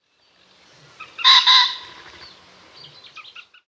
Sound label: Pheasant, Bird Calls